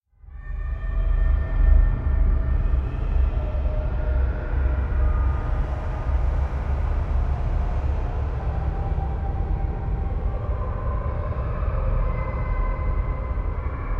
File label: Drone Eerie Underworld Children Voices - Talking And Screams; Hollywood Edge; sound designer's toolkit v2